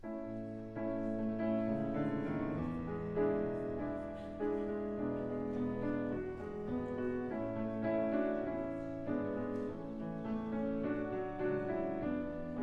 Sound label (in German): Vorspiel